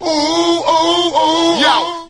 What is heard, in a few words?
ooh ooh ooh yo, Voice; ooh ahh